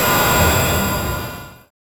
cinematic magic beam star, ethereal hum

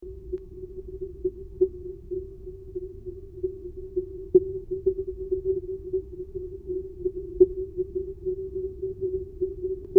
mail tube in pipe blob; scifi

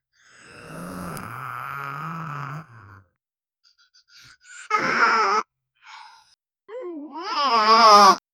a high pitched cartoon

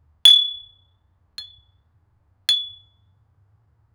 a knock on heavy glass; household

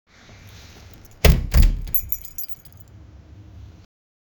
the loud bang of a, closure

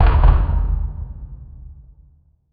short low volume demoliti; demolition

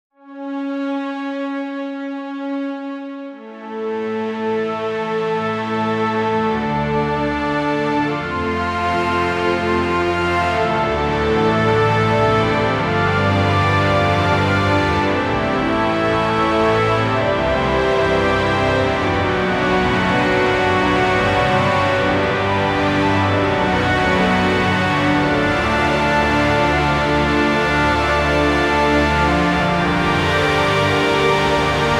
intense orchestral theme, epic

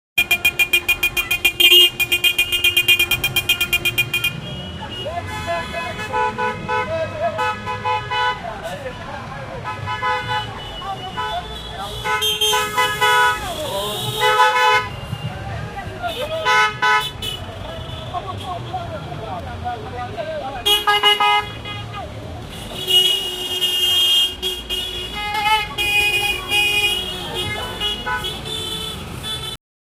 busy indian street with

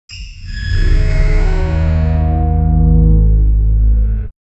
sci-fi door open sound

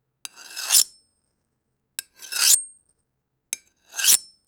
asmr audio of a knife; high detail

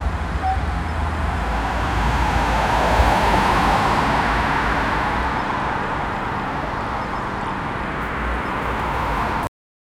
city traffic ambience

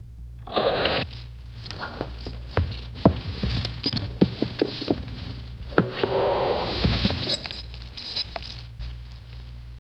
soft uneven breathing muf; warriors

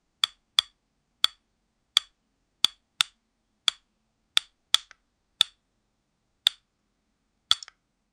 8 bit punch

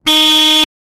pickup truck horn sound

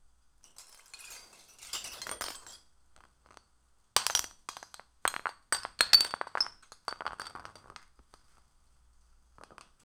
fragments of glass slowly

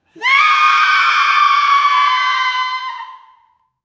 happy squeals laughter

